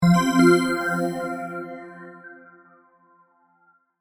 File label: Multimedia Chime, Technology, Multimedia, Chime